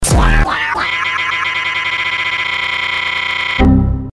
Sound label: you on kazoo; ytp